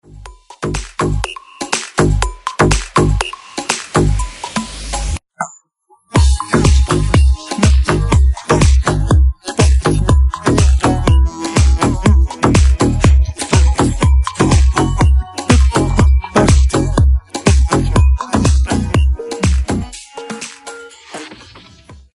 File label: beat, pop